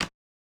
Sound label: Kicks; One Shots; Touch Loops; CosmicFunk